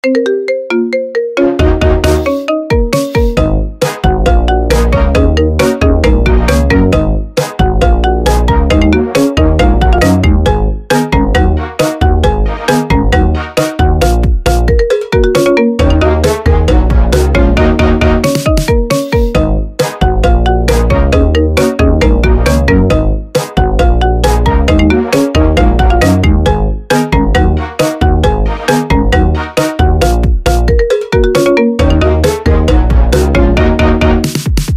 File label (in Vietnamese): nhạc chuông marimba ringtone